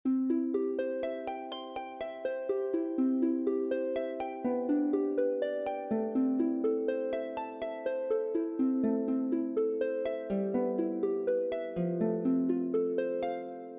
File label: Little Concertino No 2 in C Arpeggietto